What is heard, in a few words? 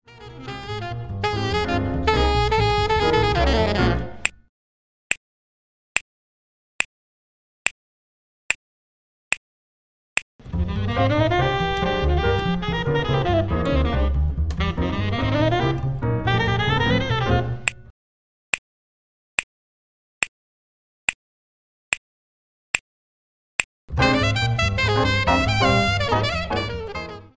minus Drums snaps; trading (with third beat snaps)